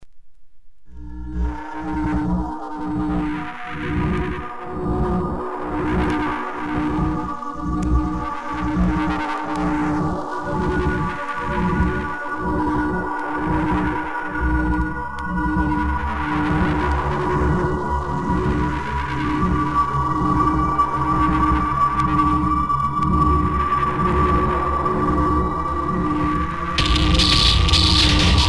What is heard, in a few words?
Chaotic industrial noise mix